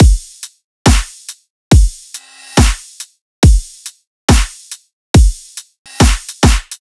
Riddim Drum Loop Full 140; Drum Loops; Ghosthack; Riddim Essentials 2020

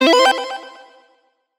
Collectibles Classic FX; Video Game; Cymatics; Video Game Sample Pack Vol 1

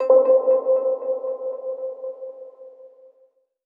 PLUCK (C); One Shots; Secrets; Cymatics; Mystery Pack Vol 4

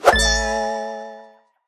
Gravity Switch FX AMaj7; Video Game; Cymatics; Video Game Sample Pack Vol 1

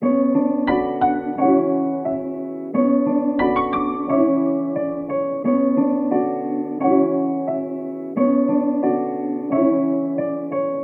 177 BPM B Min, Melody; Cymatics; 2022 Melody Collection Platinum Expansion

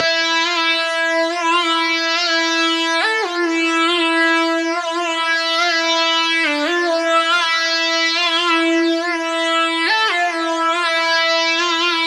Synth Loops E 140 Buzzing; Trap and Dubstep

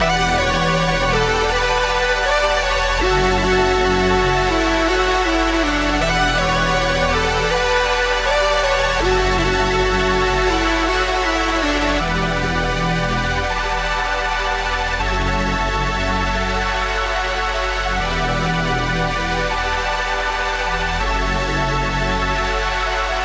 160 BPM B Min, Wavy; Landing On Mars; Cymatics; Destiny Premium Melodies (BETA)

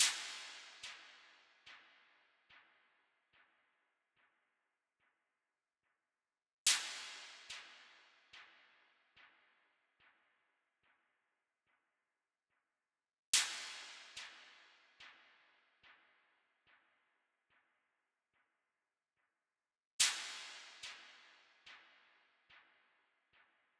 144 BPM B Min Impact, Drill; Melodies; Loop Stems; Cymatics; GHOST (Beta Pack); Break Out

